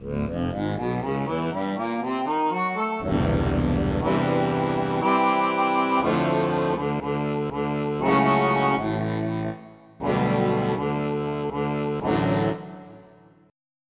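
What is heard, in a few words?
Vibrato Octs, harmonica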